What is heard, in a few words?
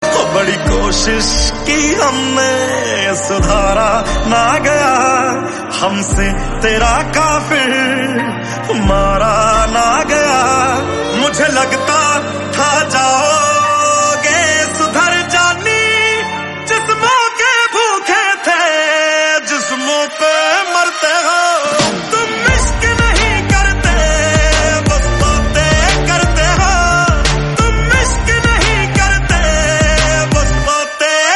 Hindi song